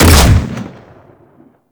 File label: Fire Shot, Weapons; Warframe; Seer